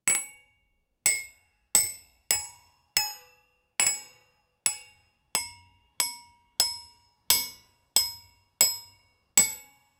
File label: rhythmic sound of two met